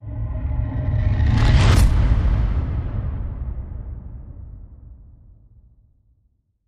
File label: Thermal Explosion Whoosh